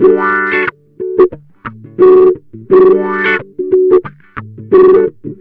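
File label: wah funk; Jazzistic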